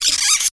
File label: Foley Squeak Plastic Squeak